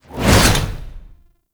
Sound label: Alchemy Grenade Throw; Entrati